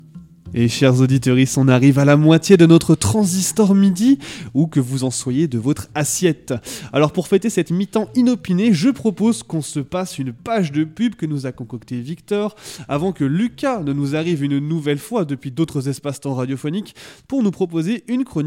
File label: transition, midi